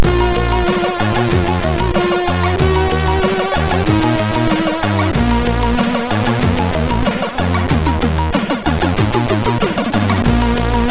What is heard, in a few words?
Intro, MOS6581R3; hvsc